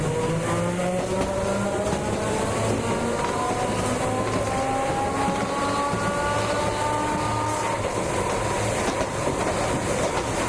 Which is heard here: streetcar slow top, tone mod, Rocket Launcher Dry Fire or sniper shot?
streetcar slow top